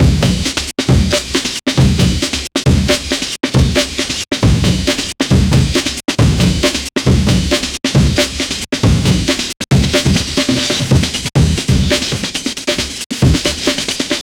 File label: old breakcore sample